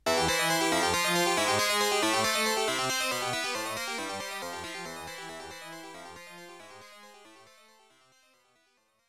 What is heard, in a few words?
continue (game over); Capcom Game Music Volume 1